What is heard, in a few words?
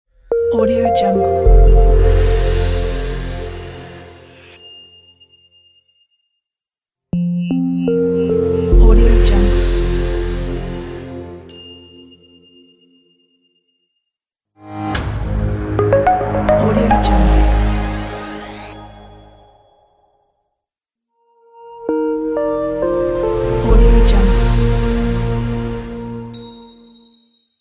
reveal corporate logo pack; piranhasound